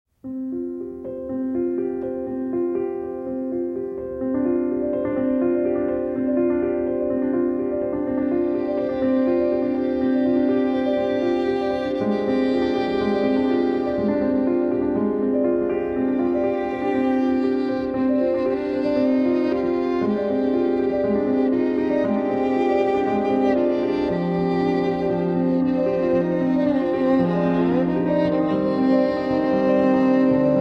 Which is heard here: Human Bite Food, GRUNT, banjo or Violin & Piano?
Violin & Piano